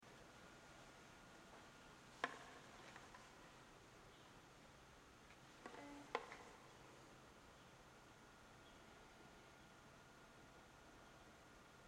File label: noise